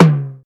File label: tom drum single hit c sharp key; Duration 0:01; Mono